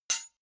knife hit metal clank

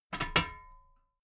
metal ladder step regular clank

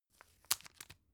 tissue open soft

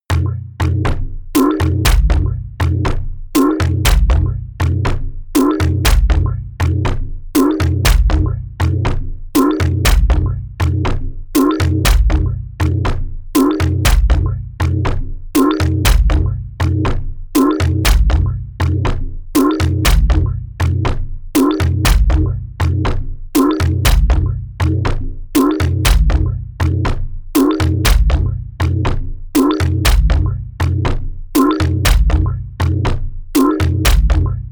sequence funny synth sequence

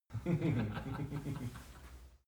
laugh male chuckle

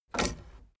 tape deck open slide

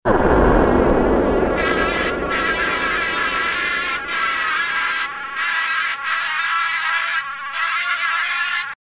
mix noise impact signals

